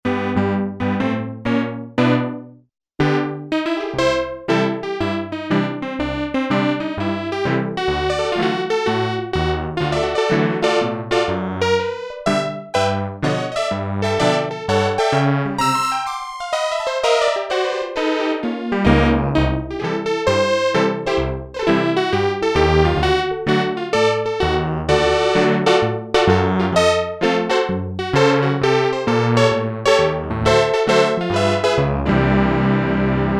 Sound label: honky-tonk piano, Korg DW-8000; Factory Patches